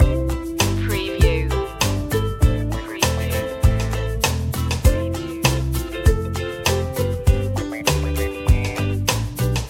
happy smiles loop

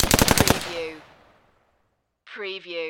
Machine Gun Colt AR-15 Short Burst Medium Range

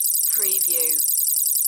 Multimedia Beeps; sound-effects-bible

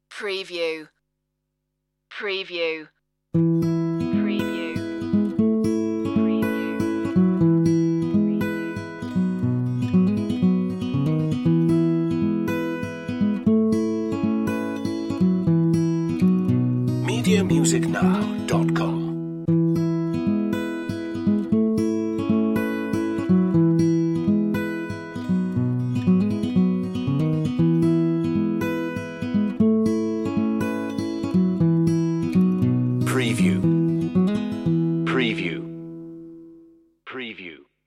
sweet just guitar

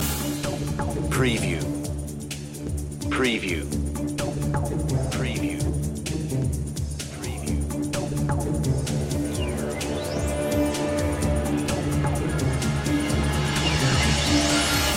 digital voodoo loop

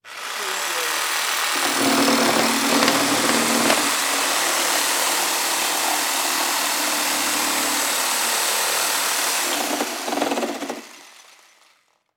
Reciprocating Saw Cut

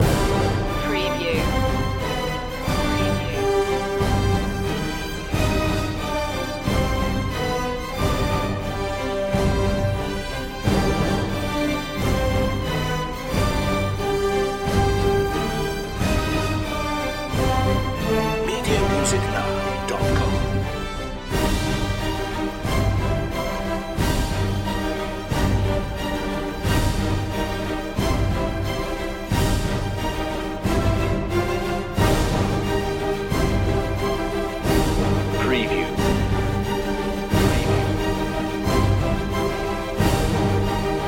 no choir

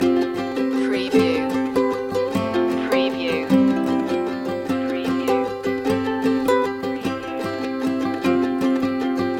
the nu-folk tune loop

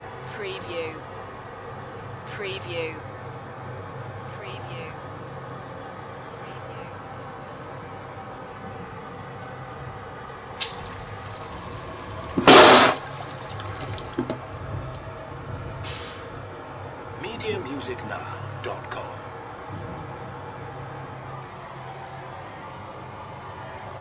train toilet flush; Vehicle / Transport Sounds / Trains / Subway Sounds

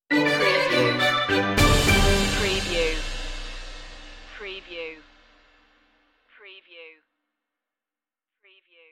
big top stinger

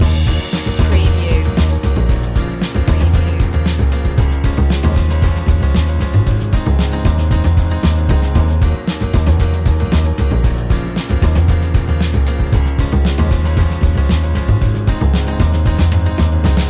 funky jazzy flow loop

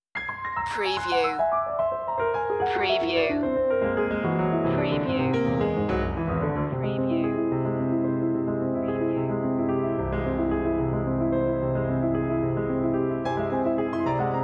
piano nightmare

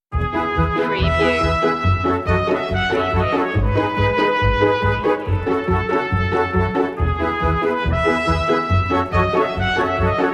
a wonderful day no perc

